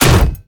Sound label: melee hit; argus; smite; hera